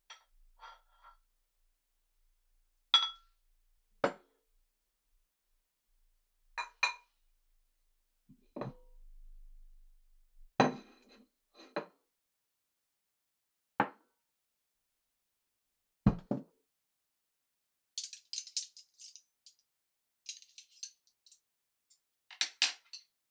配膳をする音; 3DIO; 食事に関する音

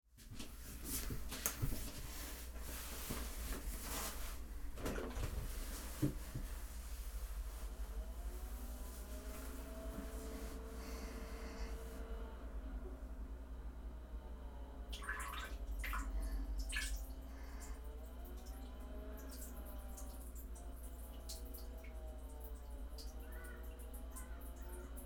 脱ぐ、座る、おしっこ音、吐息

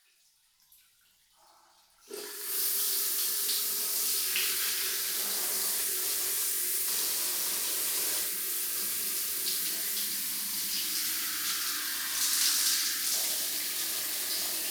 がっつりシャンプー, ASMR; 温泉素潜り; 道草屋-いのこ2 湯けむりいのこ旅慕情; 昔話耳かき; 湯けむりいのこ温泉

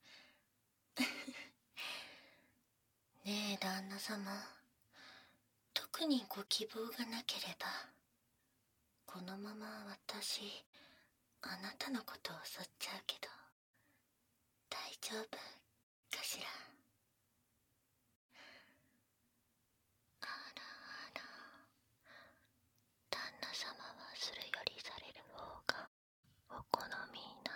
耳舐め手コキ バイノーラルマイク収録 効果音なし

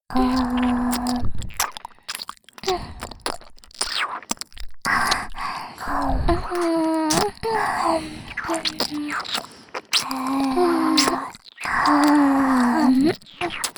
両耳舐め; 悪魔ちゃん

